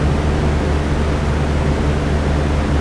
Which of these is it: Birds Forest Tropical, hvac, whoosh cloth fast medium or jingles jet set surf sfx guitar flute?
hvac